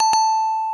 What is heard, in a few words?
breath timer; sonic